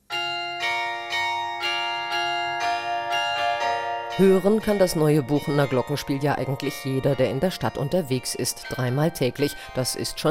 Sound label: glockenspiel